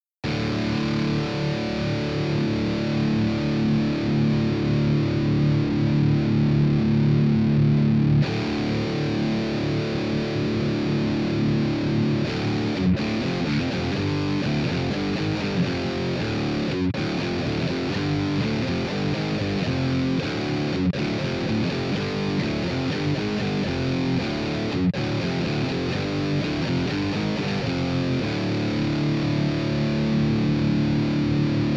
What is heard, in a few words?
Test Amp Room; HSB Style; Softube Amp Room Bundle